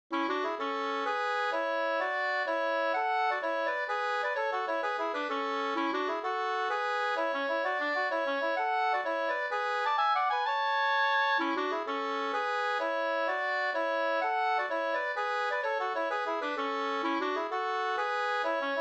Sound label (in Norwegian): pols; Leken hinnes Kokk-Sofia no 2st